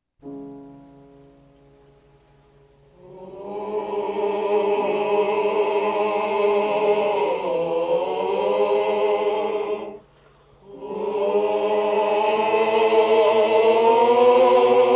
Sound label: Combination Singing of Chanson; Trieste